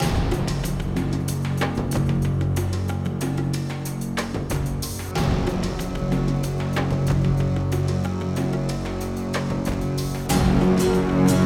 Combat; The Hobbit; PS2

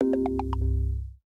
App PickUp; Going Under

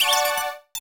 PLAY TRAMPOLIN

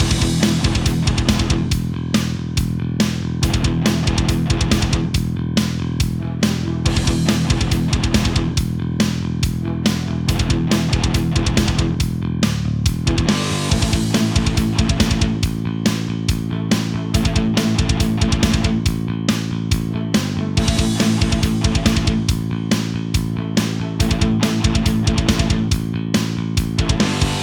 Fanfare Loop

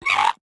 Alert; Joblin

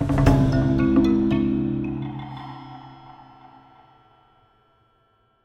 Death Stinger

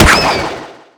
Hat KO; Hat SFX

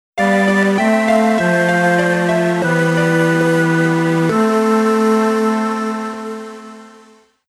Game Over; Castlevania Advance Collection ORIGINAL SOUNDTRACK (2025)

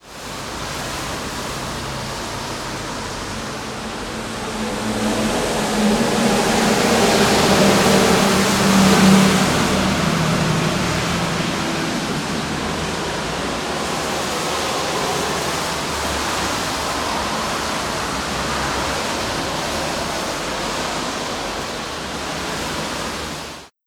Gateway Arch Highway

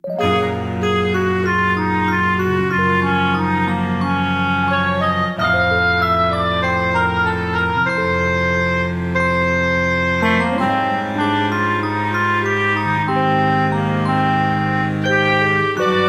Loop Melancholic; Kingdom Adventurers; Android, iOS

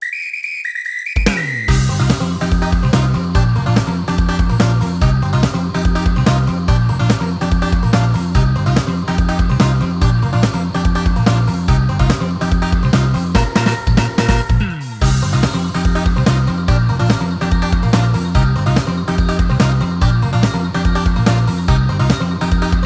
Music event; Power Pro Kun Pocket R

